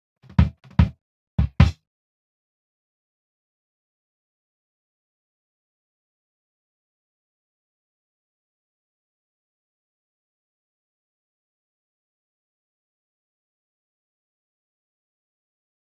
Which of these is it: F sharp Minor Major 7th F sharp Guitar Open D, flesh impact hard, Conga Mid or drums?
drums